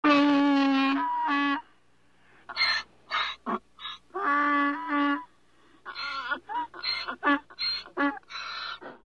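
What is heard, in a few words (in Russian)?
osel golos, donkey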